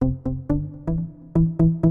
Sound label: SYNTH HOUSE 125 A; eJay; Keys